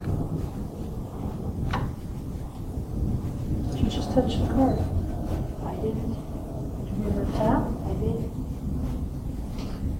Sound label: tap on cart is heard